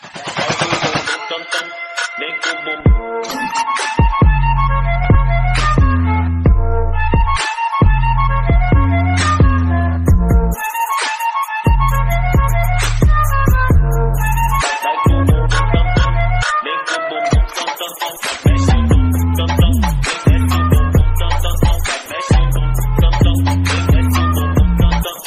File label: Arabic Music Mood Off Ringtone; ringtones